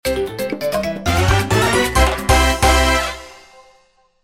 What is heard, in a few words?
victory music